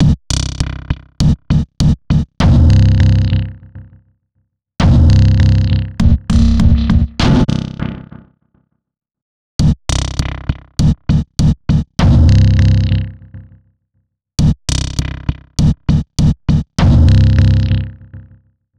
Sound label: drum